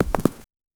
medieval knight footstep; characters

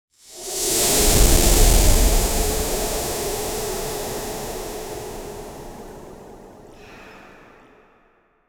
powerful conch shell blow; spiritual

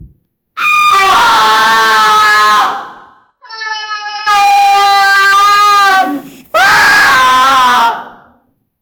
granpa screams and then; sound effect

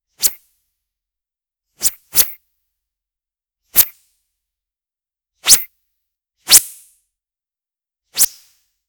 phone

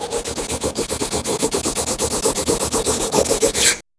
draw painting

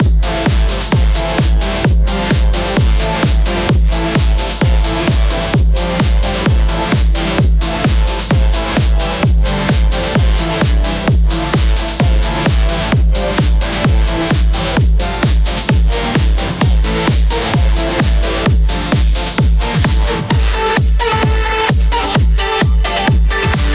disco

